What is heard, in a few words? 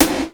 sn el; 300 Drum Machines; Yamaha Motif ES-Series